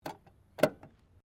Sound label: CAR MAZDA RX7 SERIES3 EXTERIOR PETROL COVER CLOSE; Car Mazda Rx7 1983 Series3; Cars; Vehicles